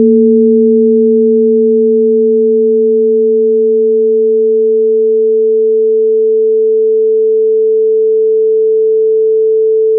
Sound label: pass 440